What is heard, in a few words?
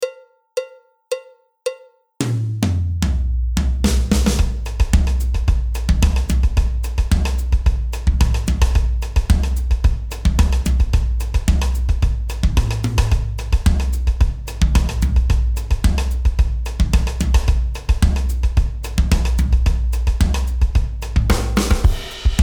brasil samba variation, drums